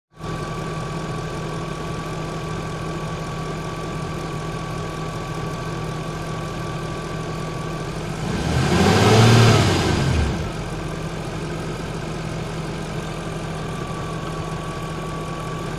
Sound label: noise c vent even when aircon off jag engine; XJ XJ8 XJR X308